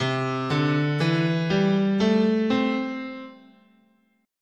C Major Pentatonic, scale

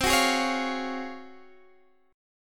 G sharp 13th C

